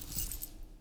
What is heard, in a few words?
foley cloth short